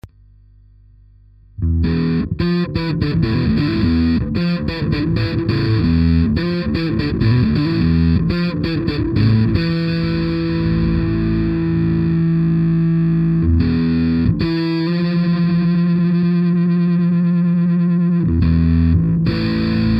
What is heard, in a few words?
b slap disto